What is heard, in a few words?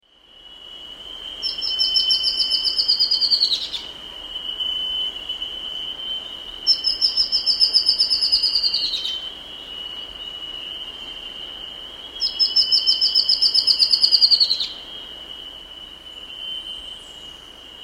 Spot-Winged Antbird; S. America